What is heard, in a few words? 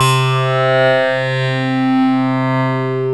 mid reso clav, swing synths; Zero-G - Funky Elements